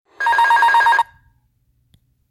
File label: Airbus Alarm